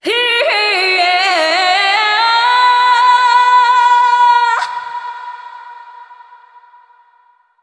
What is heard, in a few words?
Vocal Hi Yaha C sharp 170